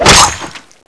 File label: turbulent hit, weapons